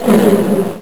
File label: Tigerzord Roar; Saban